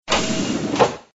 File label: close door; openspace3d; kidkitchen